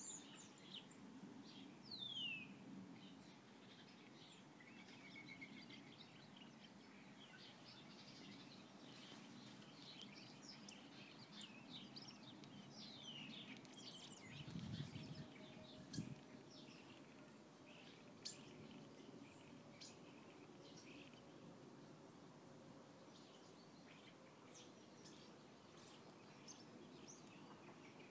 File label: birds outside sunny day